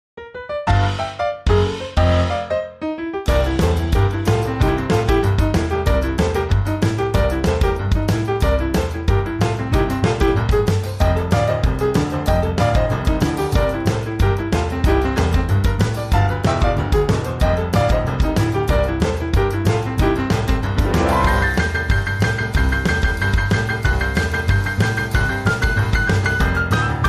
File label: rock piano performance